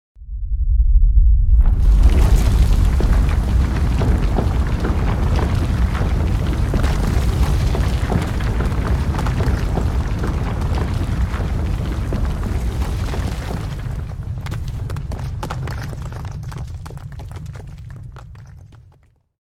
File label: Earthquake ground earth heavy large falling rock